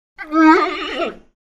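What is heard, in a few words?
Monkey Titi Call Whine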